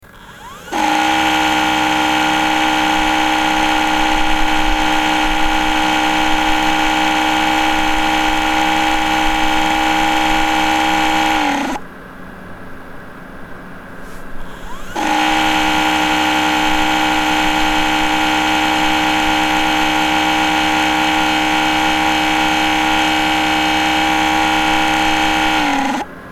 Toshiba MK2023GAS Lagerschaden

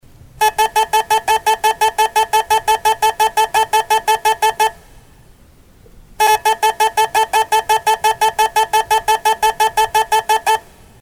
Samsung ST1000LM024 Piepen piept Festplatte macht Geraeusche

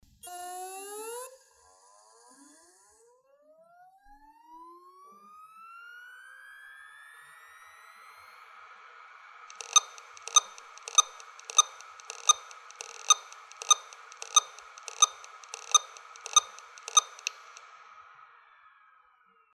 Samsung HD103UI Pieptoene

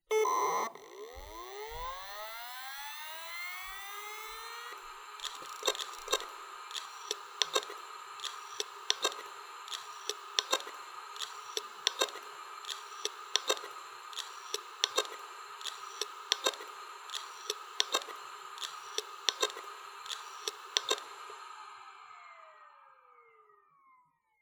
Seagate ST2000DM001 Klackert Schleift Klickgeraeusche Festplattengeraeusche

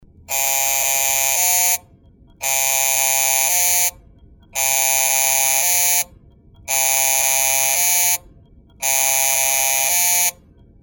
Western Digital VelociRaptor WD6000HLHX laeuft nicht mehr an

